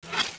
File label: patrol drone fly; cstrike